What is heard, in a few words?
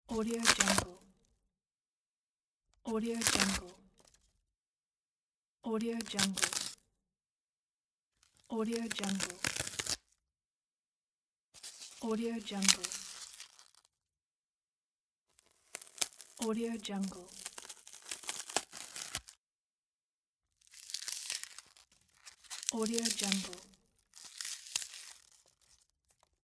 styrofoam crunch